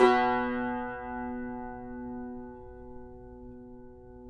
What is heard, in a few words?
CUMBUS B, Guitars